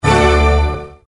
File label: common win end; bigwin